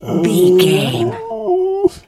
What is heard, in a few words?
bear pain whimper